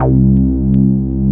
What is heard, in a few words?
C2, SynthLine; 90 s Sample CDs; NiceBeats; SynthLine VOL-20